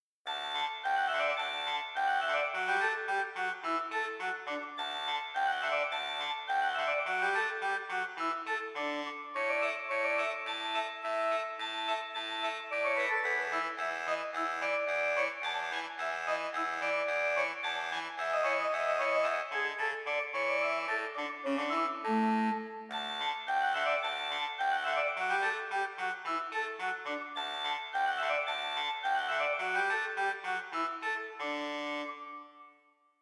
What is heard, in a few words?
musette